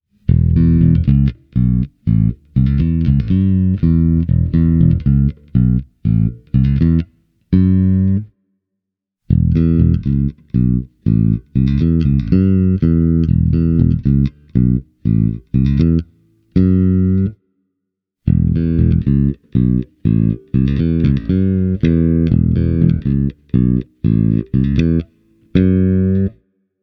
bassot GL JB 2; Rockway

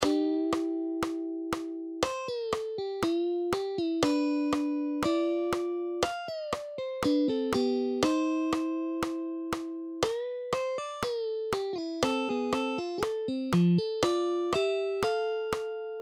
Harjoitussoolo

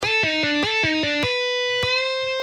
4 likki

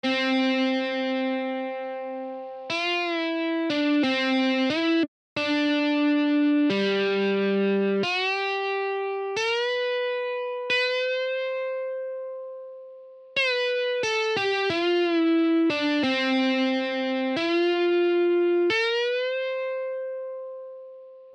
Harjoitussoolo

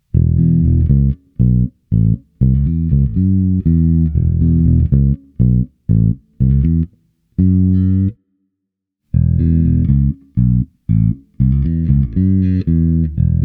bassot Samick Delta DB 104; Rockway

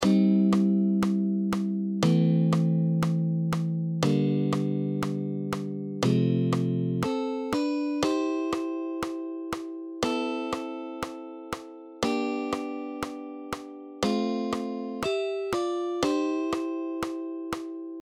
bend sointusoolo

